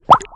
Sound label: Fizz Bubble